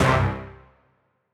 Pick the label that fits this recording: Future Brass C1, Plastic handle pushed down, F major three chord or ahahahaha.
Future Brass C1